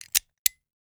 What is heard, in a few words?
Gadget; Motors & Switches